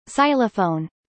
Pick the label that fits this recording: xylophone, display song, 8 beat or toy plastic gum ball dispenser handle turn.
xylophone